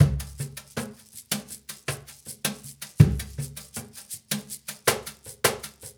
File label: Cajun, Percussion, Drums; Essential Percussion